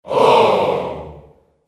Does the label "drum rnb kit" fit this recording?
No